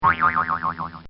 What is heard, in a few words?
Comical Sound Series Boing Spring; Funny Sounds